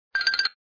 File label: new message